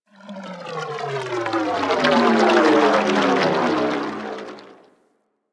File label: Ocean Cloning Machine Gurgle; Ambience; Props; Warframe; Grineer Ocean